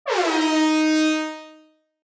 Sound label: Reggae air horn D3 sharp